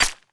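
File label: Disc Ricochet Stone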